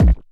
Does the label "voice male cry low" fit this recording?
No